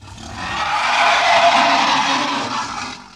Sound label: WARN